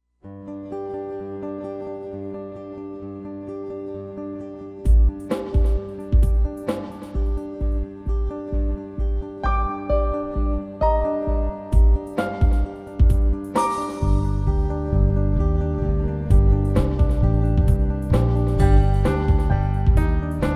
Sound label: 5 count Jam